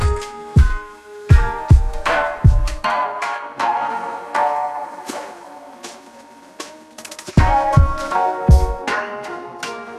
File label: Japanese koto, lofi; musicgen